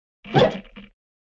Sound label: heavy cork pop